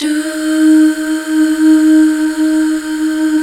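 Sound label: DUH, Female; BACK. DUUHH; Sound & Vision - Gigapack II CD 1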